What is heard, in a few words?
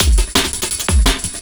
Classic Beat, Drum N Bass